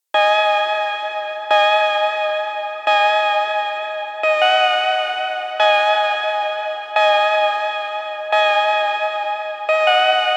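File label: Melody Loop, 88, E minor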